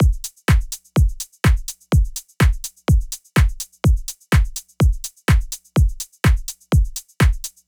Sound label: chillhouse loop 125; Electro